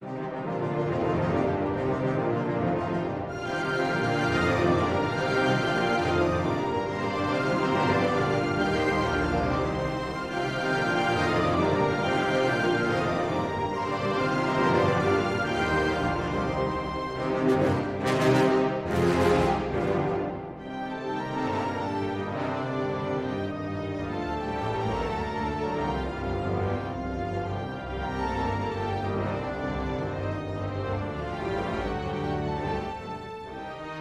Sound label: Guitar